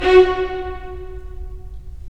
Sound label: STR, Roland